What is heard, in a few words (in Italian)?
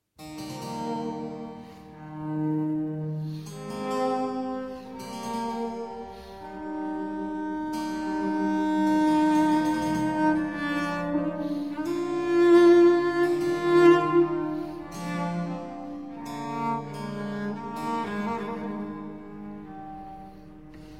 Sonata In Sol Minor, Largo, Violoncello e Basso Continuo; Op2 No4; B Marcello; Sinfonie and Sonate del settecento italiano per Violoncello e Basso Continuo